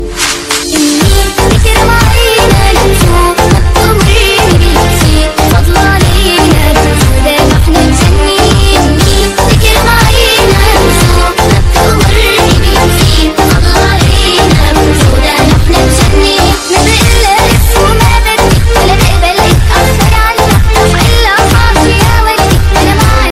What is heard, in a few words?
arabic ringtone